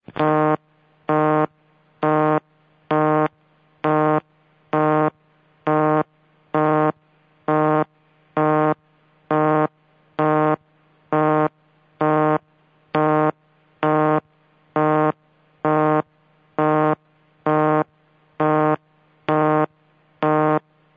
755A Busy, Sounds from a Western Electric 755A step by step PBX